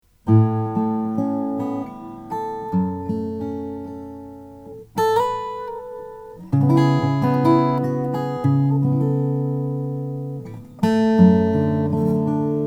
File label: grand soloist, guitars; nightingale